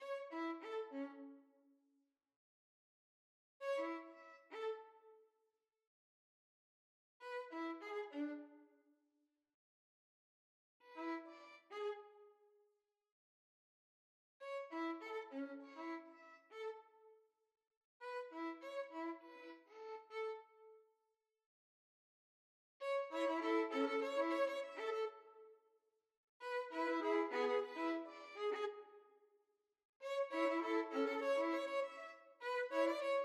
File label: skip to my lou, violin duet